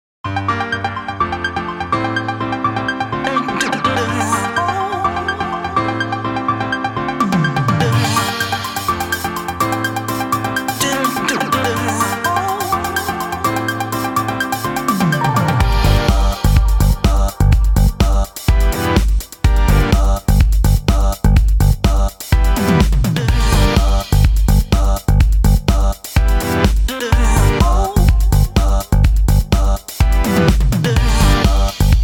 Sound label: aah machine